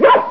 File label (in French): aboiement chien